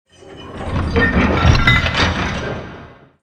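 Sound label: big chains sweetener close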